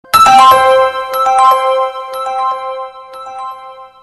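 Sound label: Android Text Message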